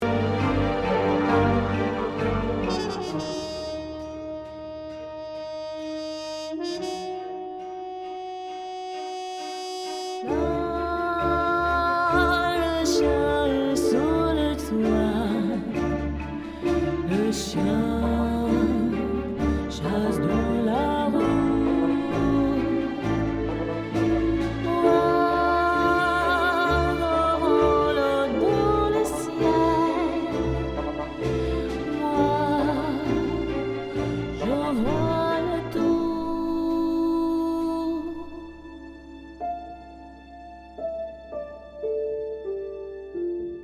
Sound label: chanteuse vocals